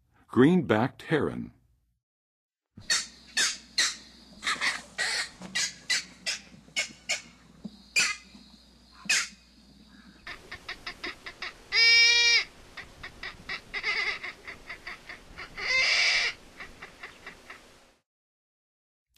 Green-backed Heron, Birds of North America; Western USA; Western USA Songbirds

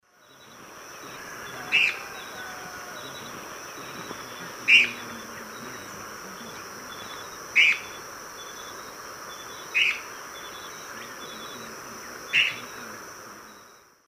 Manacus manacus, call male; S. America; Eastern Lowlands

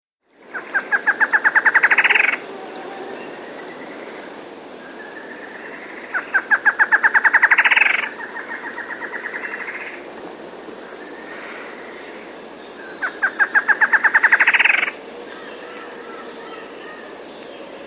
Bolivian Slaty-Antshrike; S. America

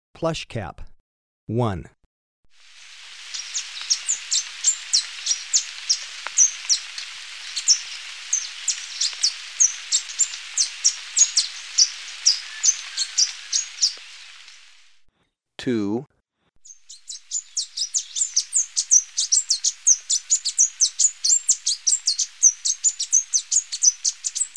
Plushcap, Birds of Ecuador; S. America

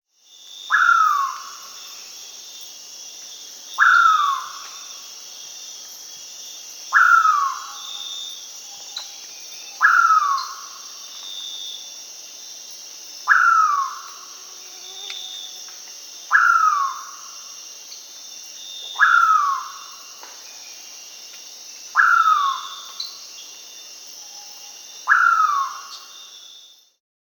Ocellated Poorwill (Nyctiphrynus ocellatus), Peru; S. America

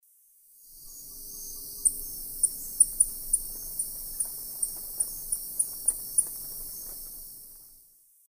Tangara mexicana, call; S. America; Eastern Lowlands

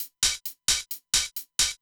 HiHat Loop, Dance Beats

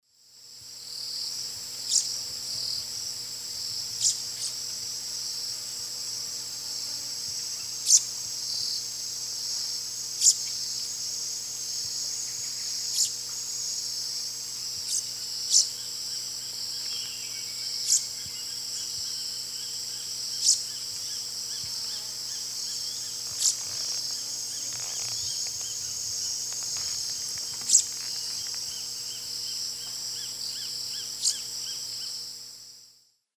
Cyanerpes cyaneus, calls group; S. America; Eastern Lowlands

